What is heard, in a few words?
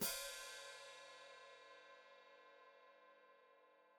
Hat Closed Open, Hi-Hat